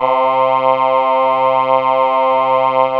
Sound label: PPG vox C4; Zero-G Ambient 1; PPG VOICES